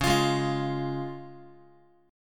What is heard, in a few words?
Db Major Db Guitar; strummed; JGuitar